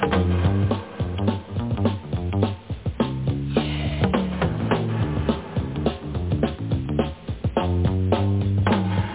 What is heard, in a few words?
theme; reservoir dogs